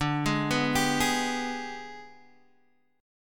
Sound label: G sharp Minor Major 7th Flat 5th